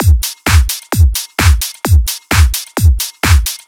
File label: Drum Loops, 130 BPM, Underground UK House & Electro